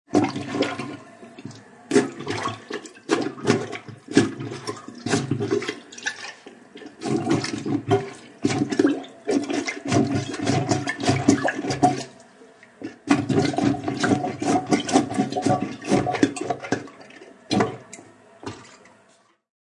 Plunging a toilet.; Toilet Plunger; Bathroom; Household

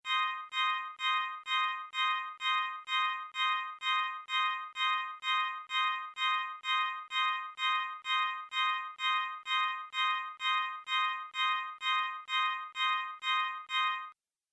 Loading Data, transition sound effects

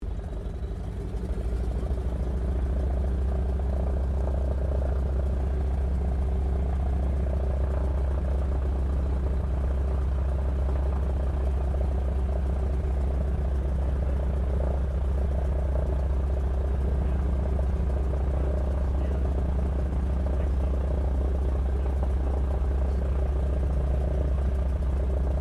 AIRCRAFT BOEING PT-17 STEARMAN TAXI INTERIOR; PT-17 Stearman Trainer; Airplanes; Sound Effects:Vehicles

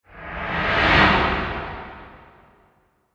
Whoosh Flange Wipe, Reverse; Whoosh Sound Effects; Whooshes; BlastWave

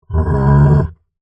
Monster Groan, Horror, Monster, Groan, Creature; Monster Sound Effects; BlastWave

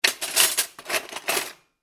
household cutlery rummage drawer; Person Rummaging Through Cutlery Drawer - Clunking Of Metal Cutlery; Kitchen (Sound Effects:Household); McKinney Sound

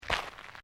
FOOTSTEPS SNEAKERS GRAVEL FINE STEP; Footstep Foley; Footsteps (Sound Effects:Human)

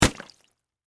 Splash Wet Mud, Texture, Splash, Wet, Mud; Splashes; Objects; BlastWave

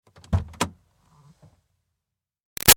Car Highlander Door Interior Open; 2005 Toyota Highlander V6; Cars; Vehicles; Big Room Sound

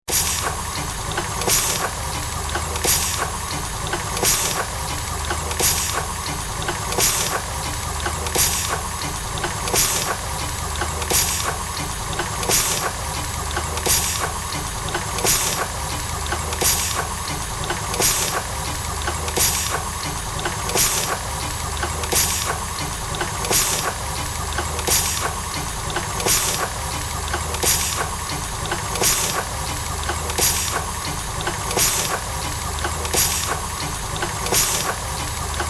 Mechanical steam engine piston; Machinery; Machines

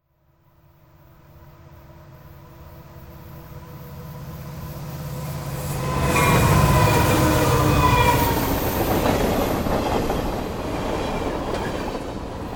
Vehicle Train Pass By High Speed; Trains; Vehicles

